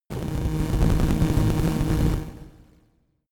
static crackle noise short, sciene fiction

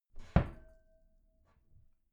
wooden door close regular glass clang

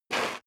step sneaker concrete grit slide short; schritte

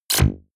sci-fi shock squeeze

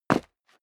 step leather shoes concrete grit single stair up; schritte

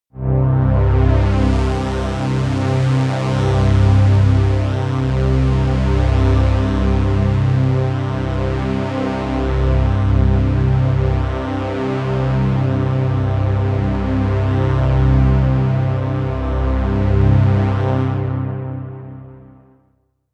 pad sweep brass; flaechen

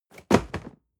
cardboard box medium fall high hard; objekte

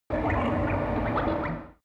extrinsic birds, energie

